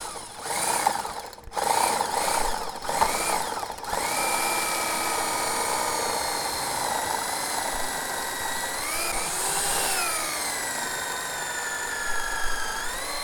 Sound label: Drill